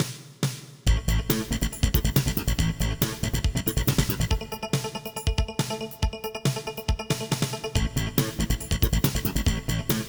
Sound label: Title Menu (Loop), MIDI Adaptor, X68000; Bosconian